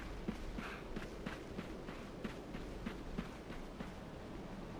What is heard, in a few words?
wood walk; limbo; perception